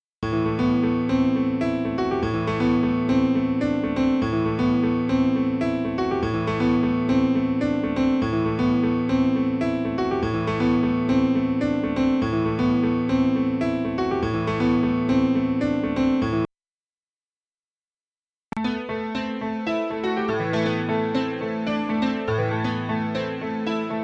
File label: Pianos; Sampletank